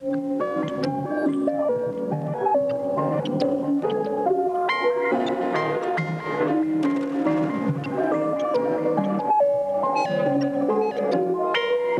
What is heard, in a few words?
horror random arp; looperman